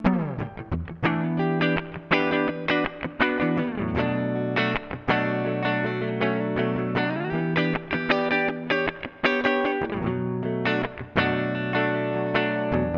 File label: reverb, tc electronic nova system